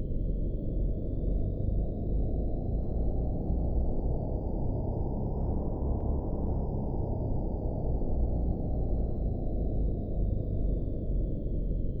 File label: background Space WHHH